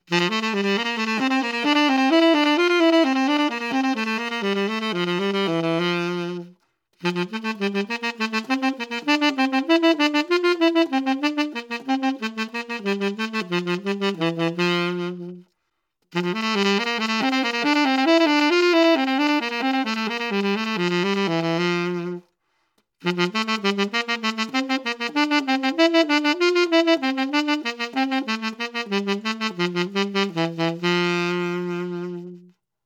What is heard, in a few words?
Tonguing